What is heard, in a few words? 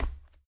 hardwood step; Golden Crayon Sounds